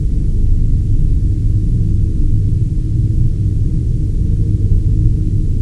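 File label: tunnel ambience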